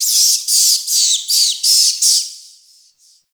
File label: Forest Distant Medium Creature Small Cranky, Ambience; Warframe; Grineer